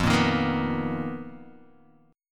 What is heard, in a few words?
B Major 7th Flat 5th F, chord shape